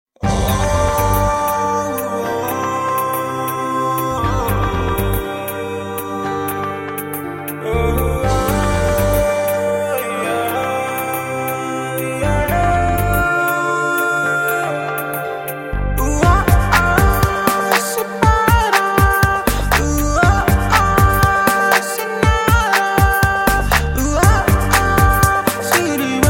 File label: BG Bit Telugu Ringtone